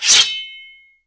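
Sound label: longblade OUT; The Elder Scrolls III - Morrowind; Morrowind GOTY GOG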